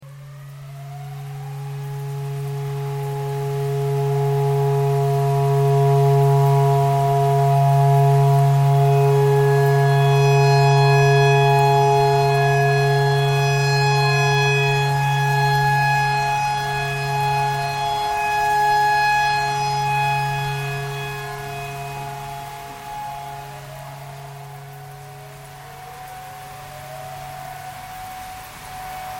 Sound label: tornado siren clarinet thread pentatonic